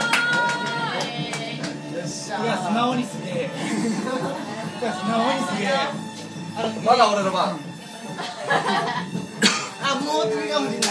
avion

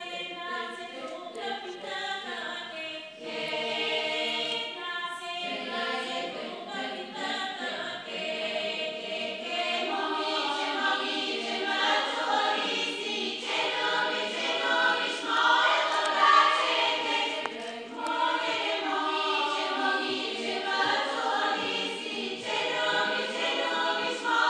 voix bulgeres

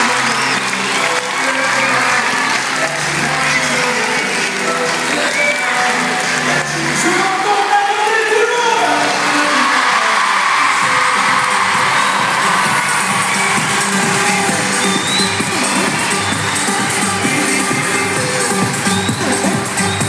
ambiance scolaires; vendespace

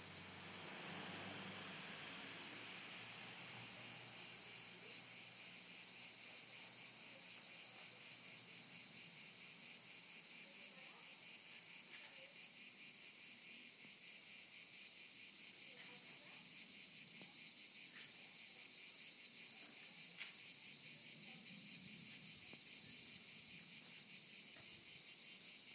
cigale; Vaucluse Tourisme

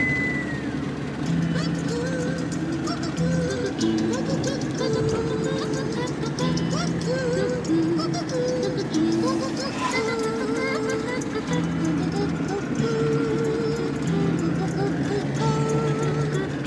musique indienne

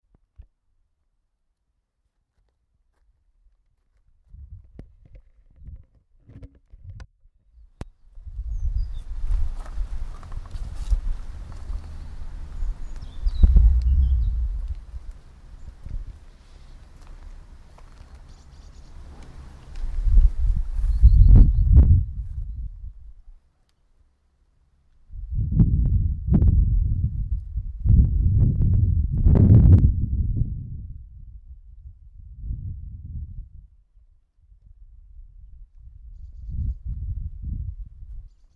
ambiance sonore parc du mas saint yrieix la perche

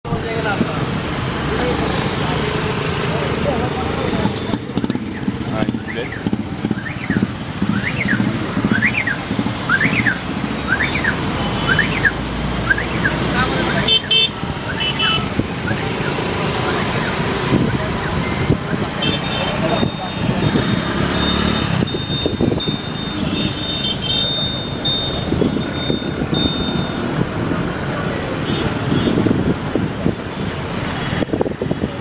ambiance de rues a pune

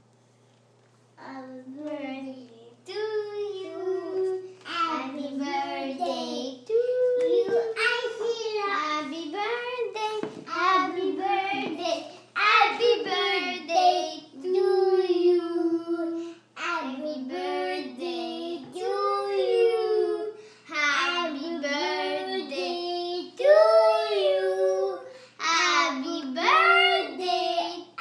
les enfants qui chantent happy birthday

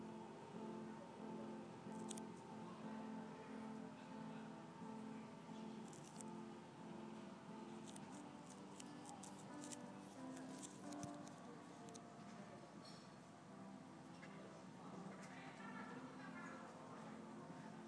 echos d imagine au piano et des bruits de la ville

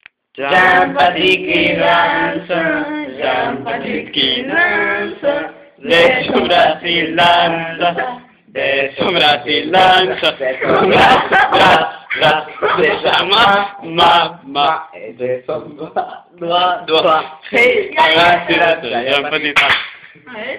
chantent jean petit qui danse; une nouvelle version

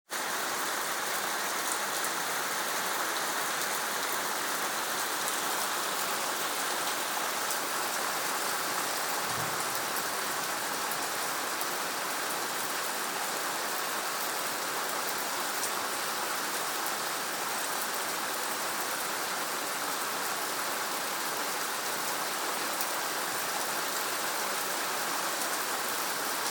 pluie dantesque sur liege

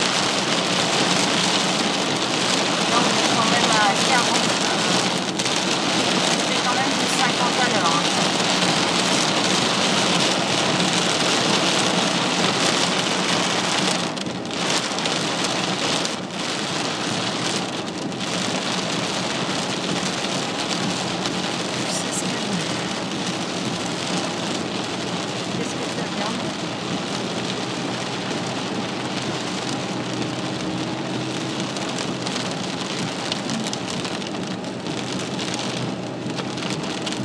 orage

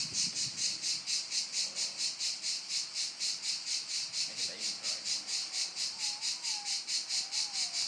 cigales

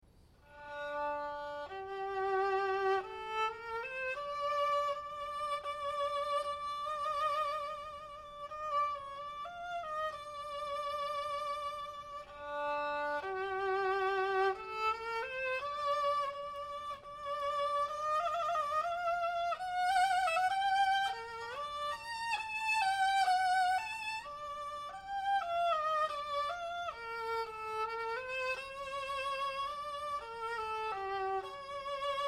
violon avec des cordes tissees en toiles d araignees

